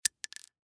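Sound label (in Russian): падение гильзы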